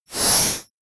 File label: Mangle